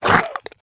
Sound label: mud